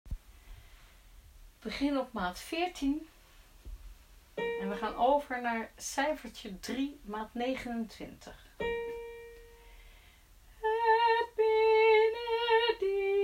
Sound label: Tenor